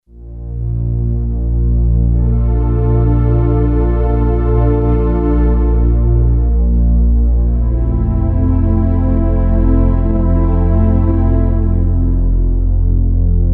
JP-8 Silk Pad, Roland JD-990; Vintage Board

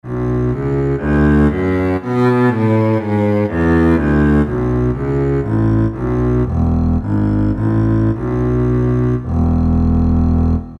Contra Bass, Roland SR-JV80-01 Pop

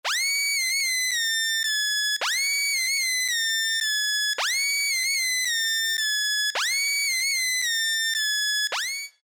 Sugar Glide; Alesis Rap Techno Dance

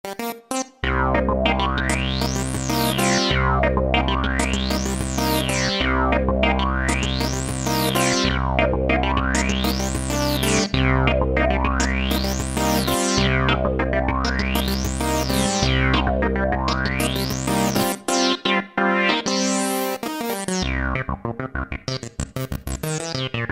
Sweep SynClav, Kurzweil K2000; Kurzweil K2000 Contemporary ROM

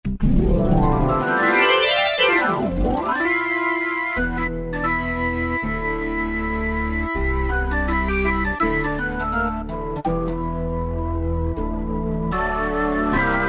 Real Prc; Factory Preset examples

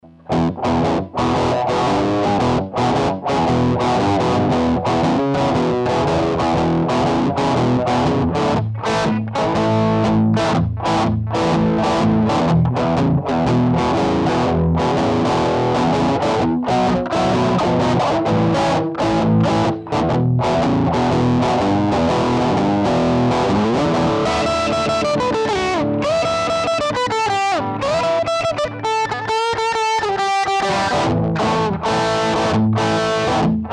TEXAS BLUES, Digitech RP200 Factory Presets

